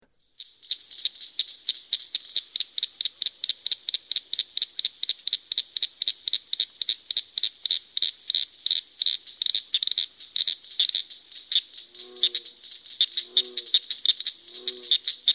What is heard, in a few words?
Northern Cricket Frog